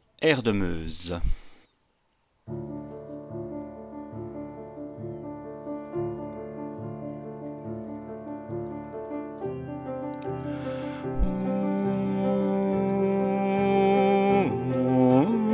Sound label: air de Meuse ALT